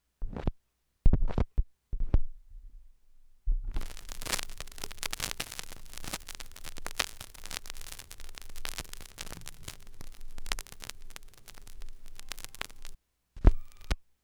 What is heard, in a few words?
Classic Record Fuzz; Micro Pack